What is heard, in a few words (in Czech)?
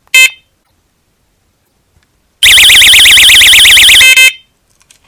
zapnut alarm vyp